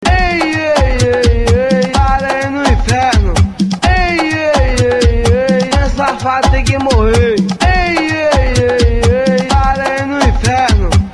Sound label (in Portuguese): bebe no inferno